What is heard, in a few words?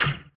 bass bad